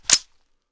ots33 slide stop, pistol; garrysmod

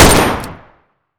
shoot, weapons m16a4; garrysmod; dmg m16a4

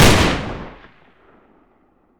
de fire, pistol deserteagle; garrysmod

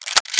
bolt pull; kriss; garrysmod

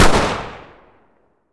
glock20 fire, pistol; garrysmod

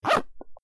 zipper; garrysmod

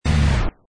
stop, truck; vehicles; garrysmod

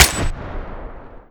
usp; garrysmod

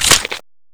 colt clip out, weapons, deagle; garrysmod

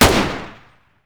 m9 fire, weapons pistol beretta92fs; garrysmod

